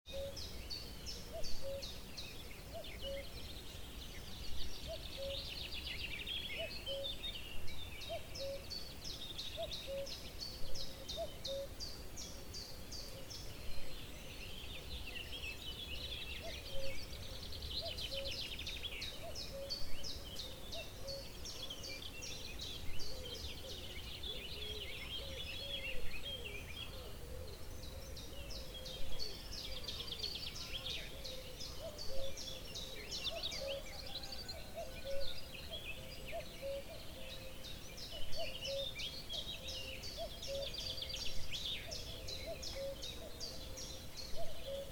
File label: morning soundscape